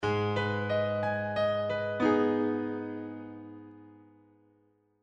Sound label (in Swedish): Tonartsgivare Gm Abm